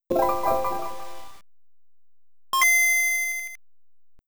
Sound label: bad gba bios sound